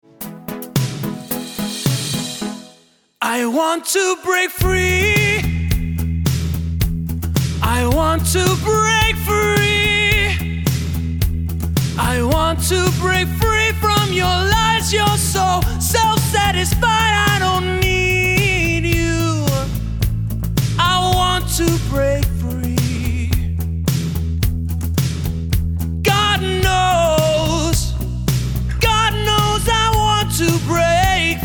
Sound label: Cover Rock Tenor